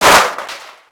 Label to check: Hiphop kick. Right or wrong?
Wrong